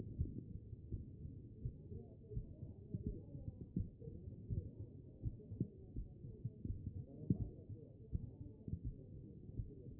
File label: chest; B MODE